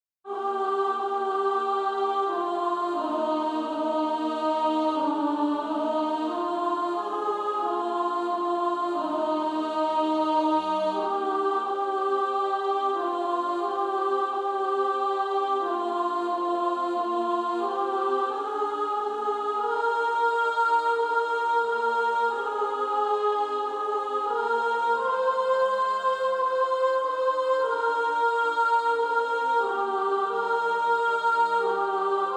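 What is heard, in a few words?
Female Choir, soprano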